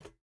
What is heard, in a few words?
footstep burlap metal jump start; Rust